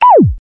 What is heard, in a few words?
whuuup, Electronic Hits